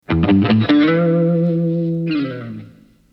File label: Rock, transition